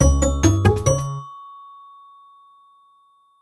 level music finish speed

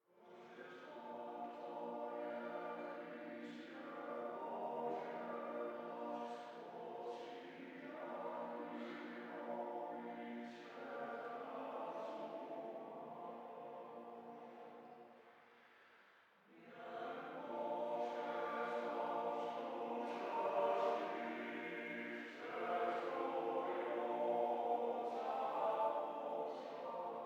Choir

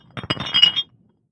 Gold Bars; The Town

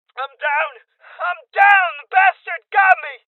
Injury Male Young

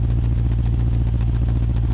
engine

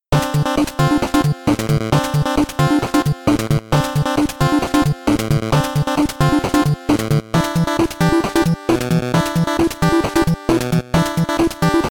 BGM MAP INTRO 8bit; Mighty No. 9; 8-bit soundtrack

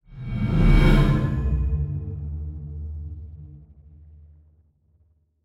Cinematic Whoosh; killer is dead

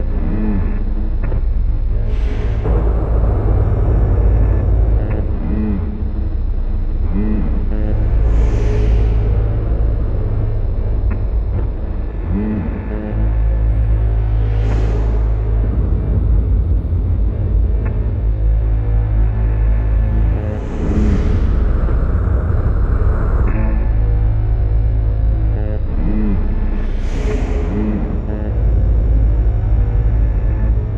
Training Ext Low Gravity Ambience; Starfire

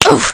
splat Female; gamerip; temple run

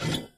Spike; Doodle Jump 2

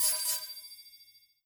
UI CHECKPOINT

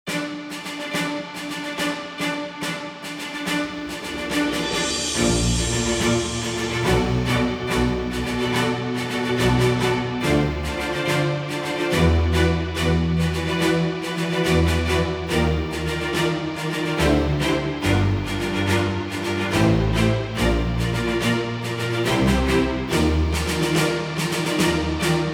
Instrumental; Fire Emblem Fates

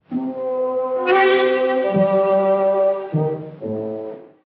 attack over

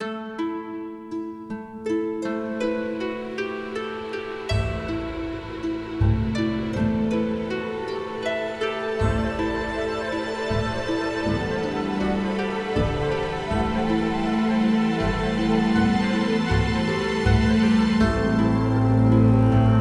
Nature Level Loop

